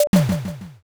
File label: toaster